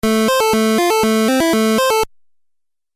Musical Phrase Video Game

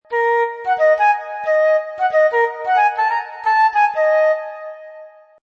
Jazz flute loop in D# minor at 180 bpm.; Jazz Flute Phrase Loop; Woodwind Loops

